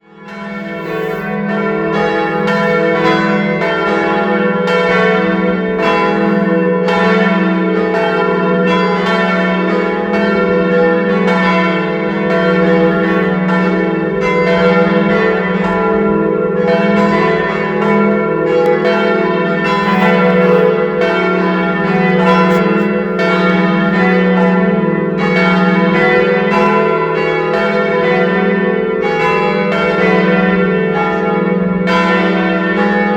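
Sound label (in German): Tonaufnahme e1 fis1 gis1 h1, mehrstimmige Geläute; 1953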